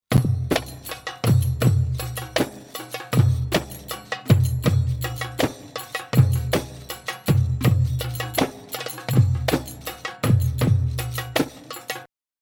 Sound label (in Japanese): リズムサンプル saidi